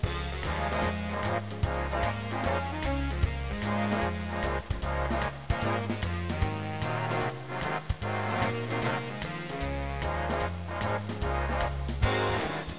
trilha; GEOGRAFIA

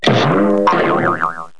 MOLA SOCO; 7invasores

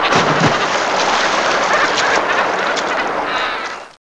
Mergulho; GEOGRAFIA

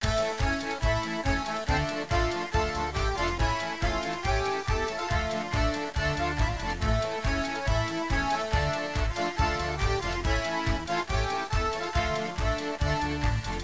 trilha; GEOGRAFIA

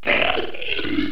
Riso, Risos

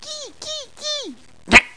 rato; GEOGRAFIA

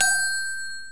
Sound lampada

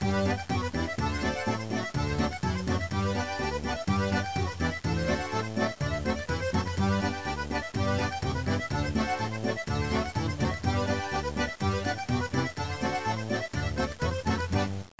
trilha; GEOGRAFIA

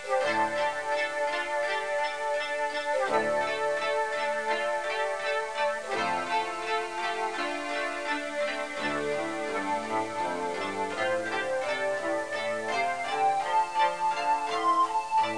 trilha; GEOGRAFIA

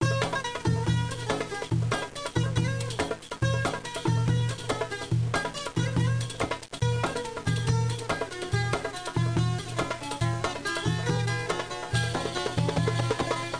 trilha; GEOGRAFIA; india

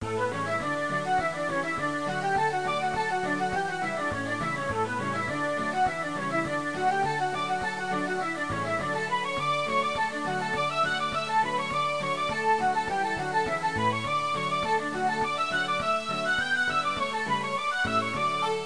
trilha; GEOGRAFIA

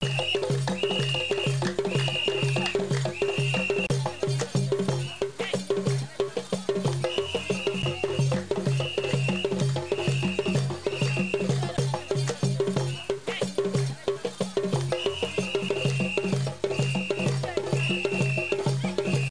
trilha; GEOGRAFIA

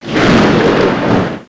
chuva

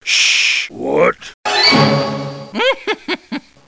Lenha; GEOGRAFIA

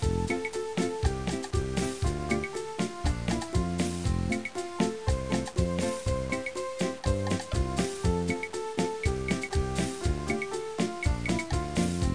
trilha; GEOGRAFIA

